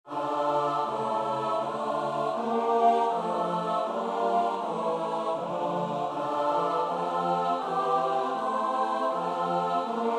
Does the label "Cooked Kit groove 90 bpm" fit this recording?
No